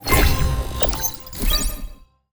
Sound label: ui load